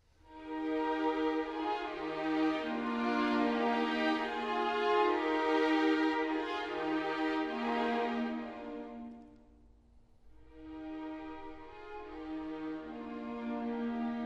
Concerto in E Flat Major for Horn and String Orchestra - Siciliano; Baroque.horn